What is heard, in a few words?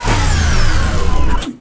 Scifi Power Down Mech Arm; Energy & Forcefields